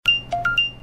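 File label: Beep Accept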